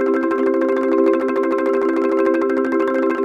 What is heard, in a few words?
marimba